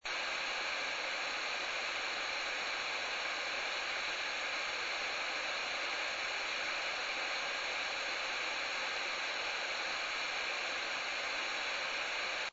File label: pink noise